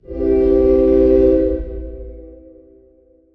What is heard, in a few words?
train horn